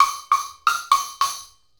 Wood Block and Rattle; Combo

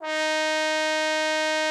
trombone D#4; Xsample VOL-3

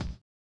collision; Spectrasonics; Metamorphosis

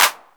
CRACKED CL, Techno Trance; Combo 1; E-MU Formula 4000 Series Vol. 2 – Techno Trance

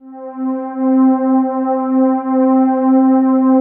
PAD, Drum & Bass, 160 BPM

